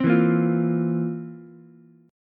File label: C 11th C down Ukulele C6; C11 strummed; C11 Chord